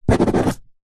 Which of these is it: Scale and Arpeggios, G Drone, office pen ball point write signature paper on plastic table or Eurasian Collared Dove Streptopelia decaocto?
office pen ball point write signature paper on plastic table